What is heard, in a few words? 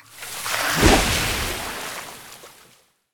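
snake boss attack, monster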